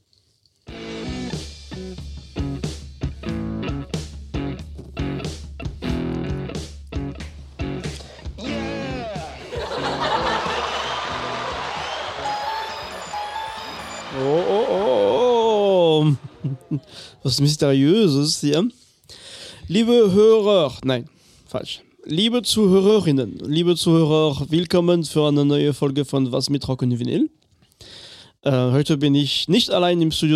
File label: psychedelic funk and jazz; melt my brain